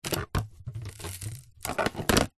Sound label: household vacuum hand held small plastic set down on hard ground; zapsplat